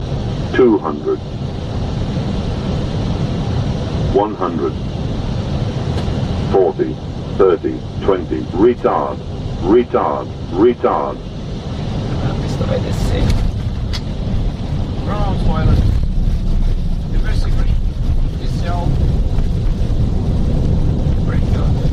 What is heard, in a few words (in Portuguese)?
pouso airbus